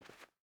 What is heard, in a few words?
footstep barefoot cloth walk; Rust